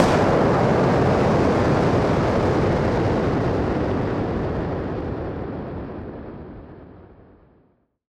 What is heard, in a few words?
Noise Bomb Harmon; musicradar; bomb factory